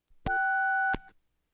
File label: Household Phones